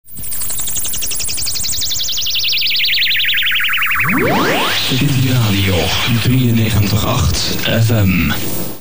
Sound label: city jingle